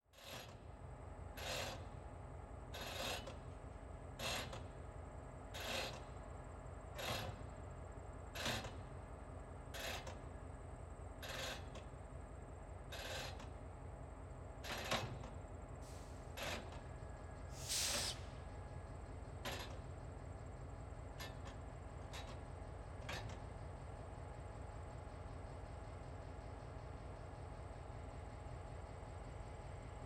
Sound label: Ambience Train Yard; AdobeCC